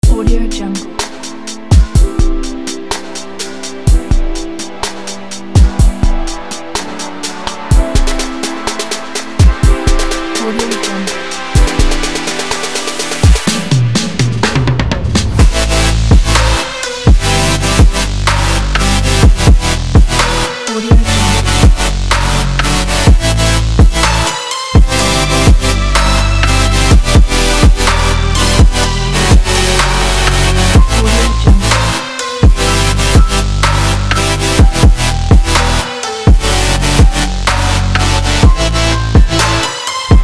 future bass

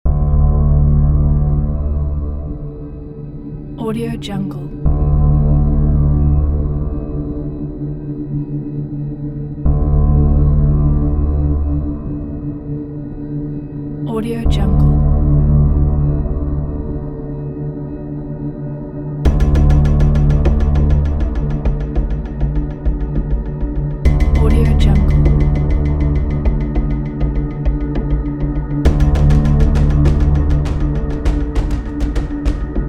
void tension

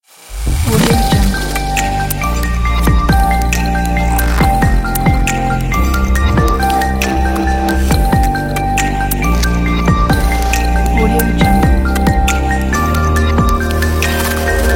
glitch

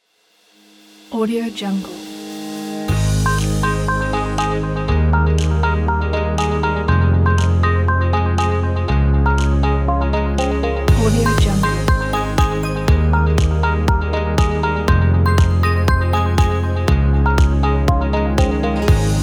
business celebration; golden sound

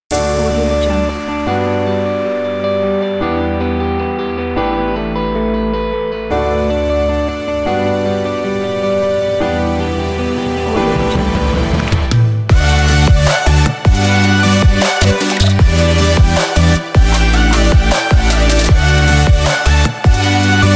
futurebass energy emotional

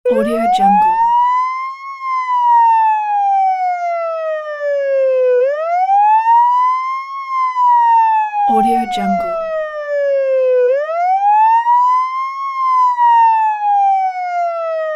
fire truck siren

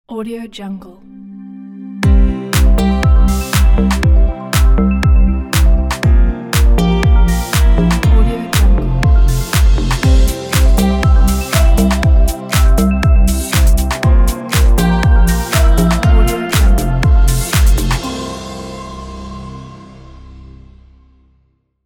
opener logo

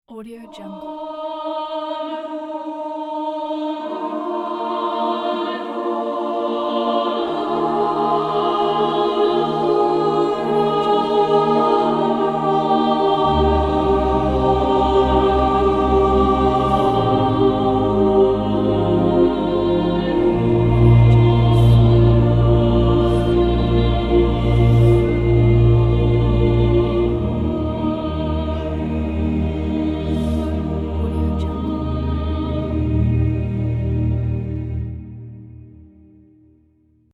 cathedral choir

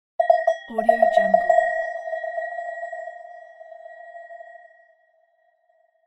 zen sfx

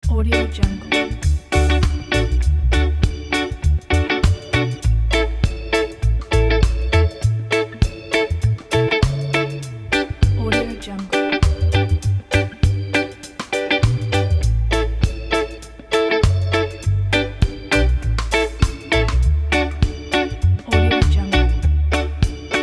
summer island reggae

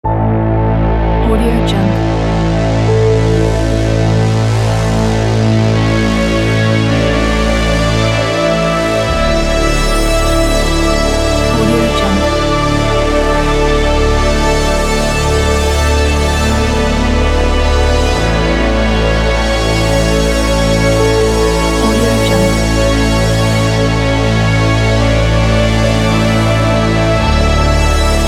ambient background; antracto

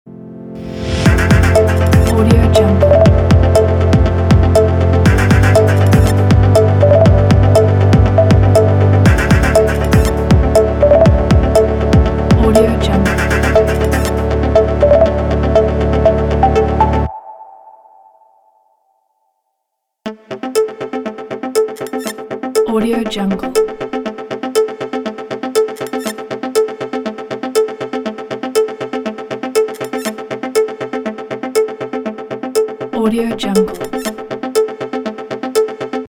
intro news; choicetune

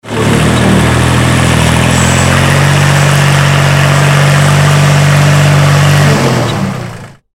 a tractor; a tractor 22

